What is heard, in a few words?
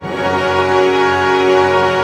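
pentatonic F# fortissimo, orchestra; Sound On Sound - Peter Siedleczek's Orchestra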